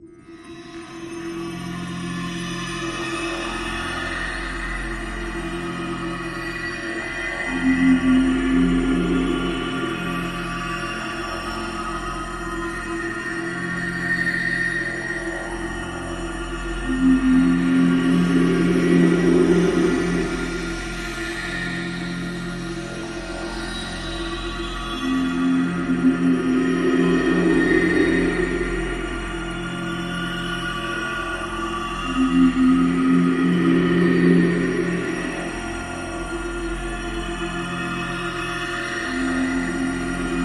Happening-sustained strings with shimmering effect and low-end resonating sweeps; bell